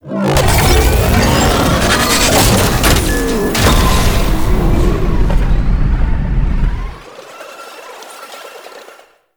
Finisher C; Jackal Redux; Cinematics

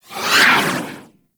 Doom Sword Projectile By; Warframe; Excalibur

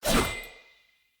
Short Sword Throw; Weapons; Player; Swords; Sollos

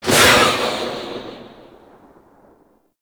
Rifle Tail Outdoor; Zariman Grn Wraith

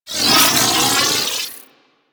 Missile Swarm Proj By; Warframe; Corpus; Corpus Railjack; Spec Ops Arachnoid

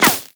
Laser Impact Flesh; Warframe; Bullet Impacts

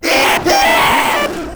Proto Infested Runner Player Spotted; Warframe

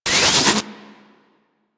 Powerplant Large Electricity Hit; Warframe; Corpus; Railjack; Tether Power Plant Exterior

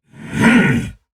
Attack Effort; Warframe; Dax Heavy; Battle Barks

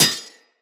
Melee Sword Impact Generic

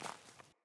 Grunt Boot Walk Veg, Footsteps, Boots; Enemy; Sinecure; Melee Grunt

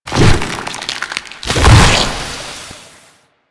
Proto Infested Babau Rolling Rot Start, Enemies

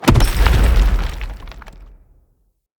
Cannonball Butt Slam; Bromius

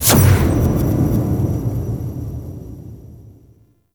Choice Menu Confirm; Warframe; The New War; Table For Two

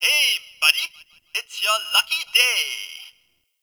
Gambling Machine Bark; Warframe; Ambience; NineteenNinetyNine

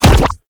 Ink Rifle Reverse Ink End, Weapons; Warframe; Tenno

